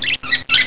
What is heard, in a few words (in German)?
VOGEL